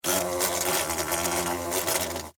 Wasp Attack, Wasp, Attack, Fly, Wings, Buzz, Insect, Bug; Wasps; Sound Effects:Animals:Insects; Blastwave